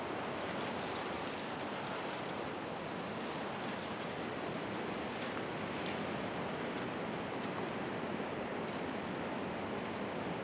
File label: outside forest low; ambient; Counter Strike Model Pack; CS:GO; survival